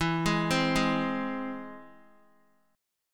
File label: E Augmented E, Guitar; E+ arpeggiated; JGuitar